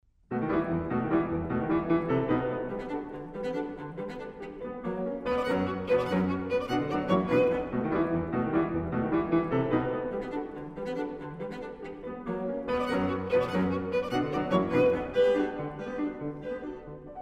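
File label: piano trio; onslow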